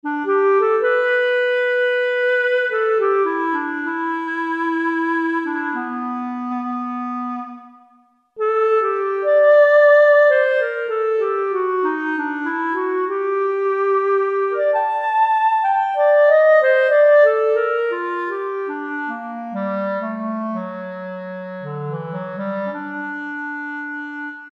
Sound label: Clarinet, Roland SR-JV80-02 Orchestral